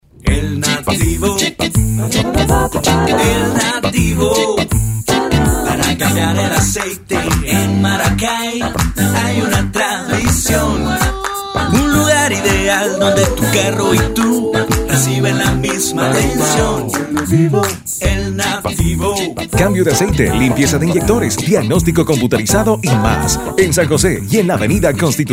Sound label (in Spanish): jingle; El Nativo